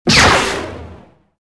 hit wall, blaster; weapons

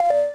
fed beep access; interface

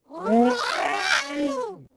droid die; droids robots; coruscant

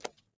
fs concrete, footsteps

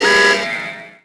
sk8 goal warning; Tony Hawk's Project 8; XBOX

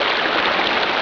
river, water; Tony Hawk's Project 8; XBOX

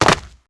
jump Land Default; characters

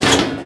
trash can lid pickup

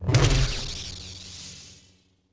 death Stab; impacts explos; quigon

